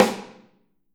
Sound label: Snare OH; Drums Percussion